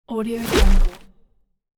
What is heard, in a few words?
transition smack rubbish paper rusty crunchy; transitions and movement; خرد شدن کاغذ ترد